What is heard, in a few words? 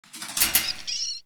Animal Trapped Metal; Zomboid 41.78.16; Project Zomboid